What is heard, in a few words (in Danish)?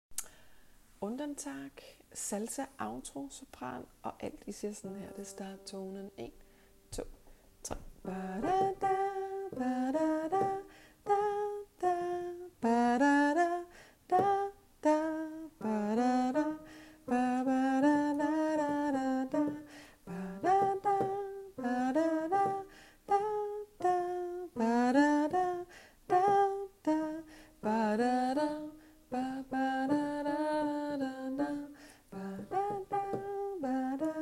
salsa outro sopran og alt